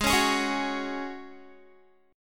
Ab 7th Suspended 4th Ab down Guitar

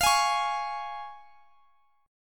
C sharp Major E sharp

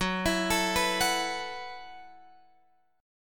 F sharp Minor 11th, Guitar, Open F; F#m11 arpeggiated; x,13,16,16,14,13

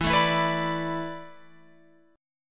E 7th Suspended 4th E down Guitar Open F; E7sus4; strummed

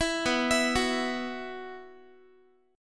B Suspended 4th, Guitar; Bsus4; arpeggiated; 19,19,19,16,x,x

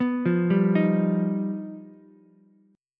G Augmented 7th, Ukulele; G+7 arpeggiated; JGuitar

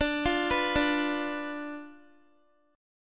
D Minor 6th Guitar; Dm6 arpeggiated; x,17,17,16,14,x

